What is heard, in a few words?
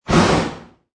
Fire Torch Single Blow Out, Blow out fire, a strong gust of wind or air blows out flame torch.; Also good as a fire special fx; Lynne Music